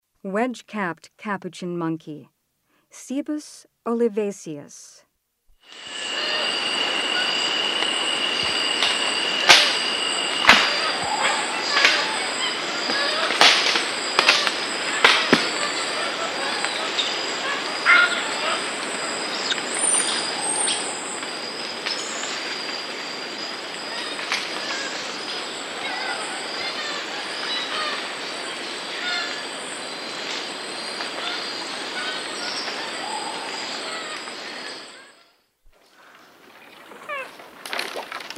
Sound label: Wedge-capped capuchin monkey; S. America; Rainforest